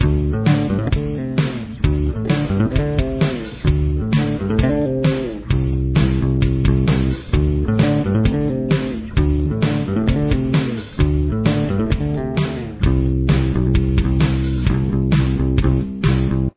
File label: bass bridge